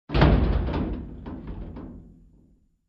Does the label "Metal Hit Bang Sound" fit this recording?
Yes